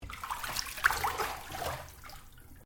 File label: Water Bath Spa Movement; Domestic water movement; Water Sound Effects; Nature